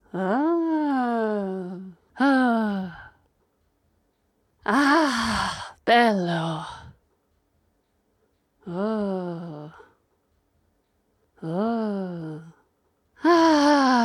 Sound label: Spa Ambient Loop